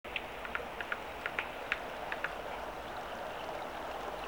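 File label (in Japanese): コゲラ, 野鳥の鳴き声; 筑紫小野鳥観察ｸﾗﾌﾞ支援; chikushishou bird watching club 2018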